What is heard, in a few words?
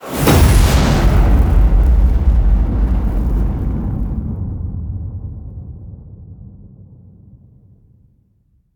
HIT Fire, Cinematic Hits Designed